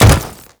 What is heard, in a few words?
Step Default; Warframe; Grineer Leto